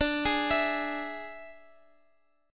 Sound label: D Suspended 2nd Flat 5th D, Guitar; x,x,x,12,12,15; arpeggiated